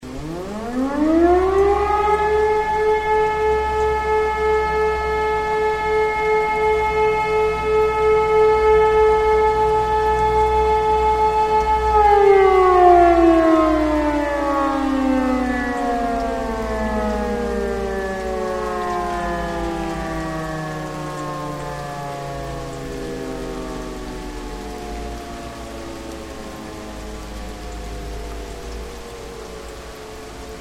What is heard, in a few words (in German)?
Sirene